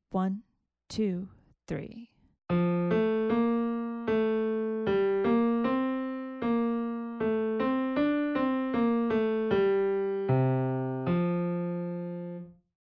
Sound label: melodic dictation